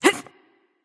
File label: grunt jump; smite; voicepack; hera